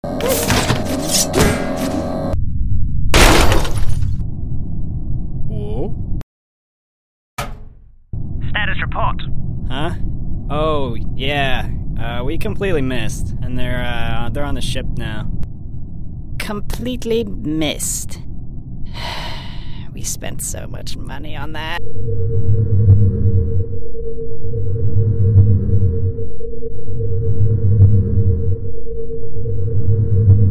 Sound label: Mosquito Mode; Space Scooter